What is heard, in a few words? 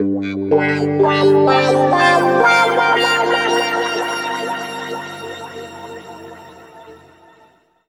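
G pitch shift wah, Guitar Samples; East West; Steve Stevens Guitar Samples Collection; PTCH-SHFT+WA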